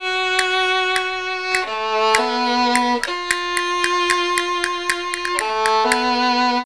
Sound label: Castanets Violin